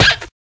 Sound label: concrete, footsteps; player; source engine; left 4 dead 2; clown